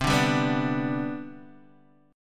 C Major 7th Suspended 2nd C down Guitar Open D, chord